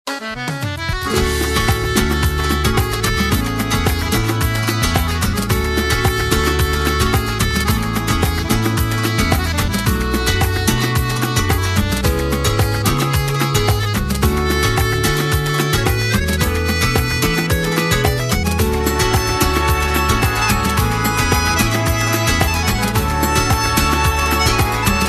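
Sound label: romantichnij rington, romantic ringtone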